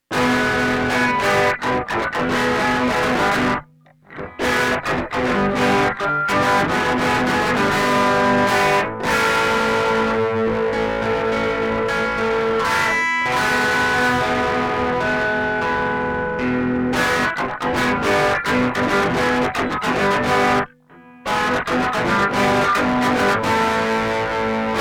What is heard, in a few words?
Fundamental Force electric